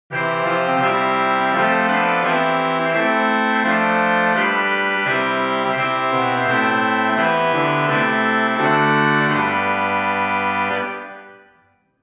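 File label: Great Trumpet 8 Clarion 4; St. Anne; Hauptwerk; St Annes Moseley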